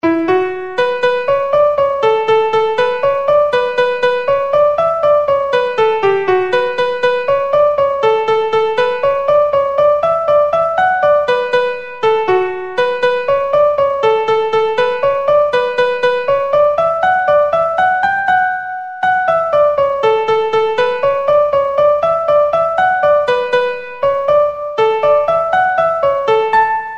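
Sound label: Jig, Traditional Irish Music; Aarons Quay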